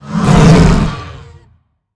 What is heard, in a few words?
Monster Hurt Big